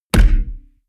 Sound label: heavy bass saturated damped, hits impacts; whooshes rises